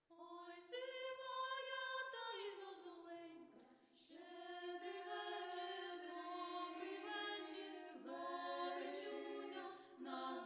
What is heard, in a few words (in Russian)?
Вокальный анс; Ой сивая та і зозуленька; Вокальный анс музыкальной студии Глория